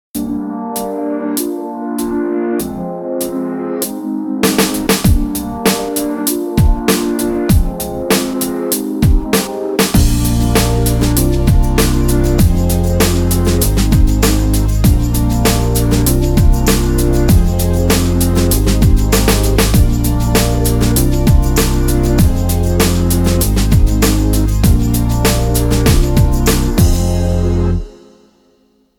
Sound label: abstract lounge